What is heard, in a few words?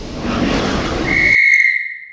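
Door Close, Train; OpenBVE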